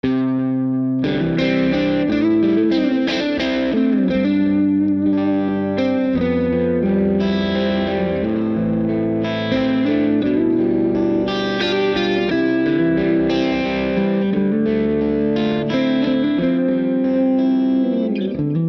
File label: Hollowbody, amps; Sonic Edge J&J